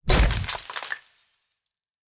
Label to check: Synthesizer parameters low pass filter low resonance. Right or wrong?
Wrong